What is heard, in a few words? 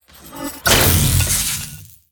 Drill Golem Death